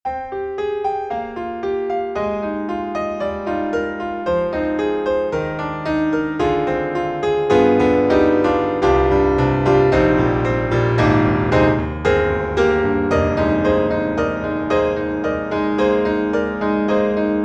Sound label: Bosendorfer 290 Close; Steinberg The Grand 3; Scenes from Childhood